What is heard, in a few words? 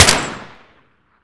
scarh; garrysmod